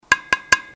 Toc Toc Toc

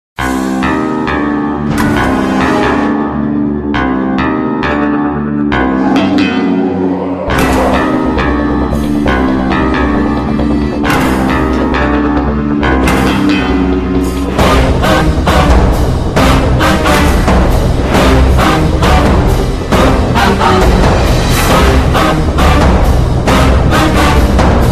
bengali; Amanush

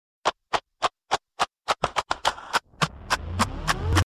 Tick Tok Sms

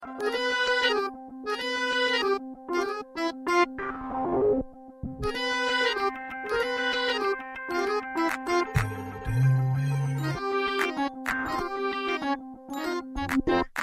Harmonium

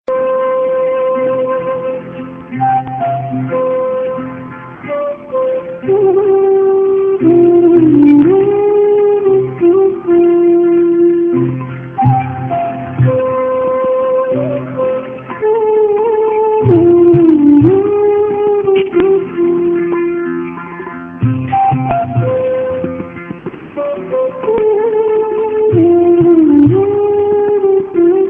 Waiting Tone; Bsnl

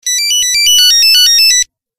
Old Retro Nokia Tone

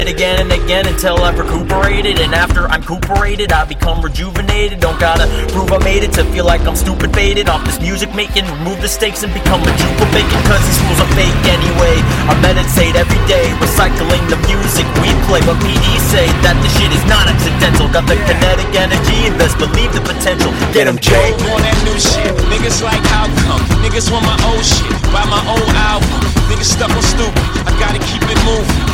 Bhangra Mix

